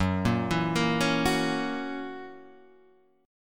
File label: Gb 7th Gb down, chord; arpeggiated; JGuitar